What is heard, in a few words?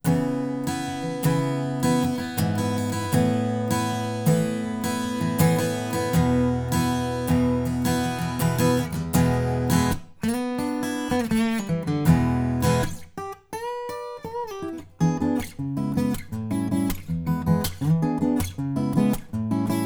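Acoustic Guitar 2 Mix Mic A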